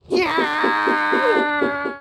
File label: hyaah